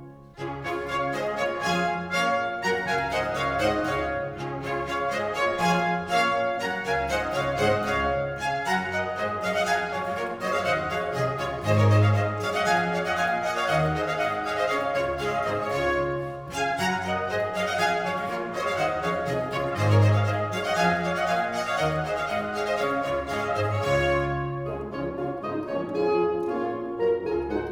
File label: Bourrée; Handel; Water Music, Suite No. 2, HWV 349